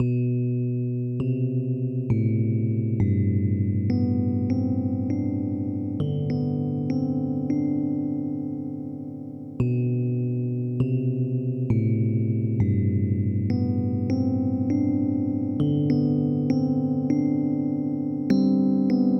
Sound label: Electric Piano; Rock; Synths & Keyboards